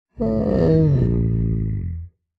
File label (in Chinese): 嗅探兽待机声